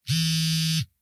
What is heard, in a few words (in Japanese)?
keitai; 携帯スマホのバイブ音1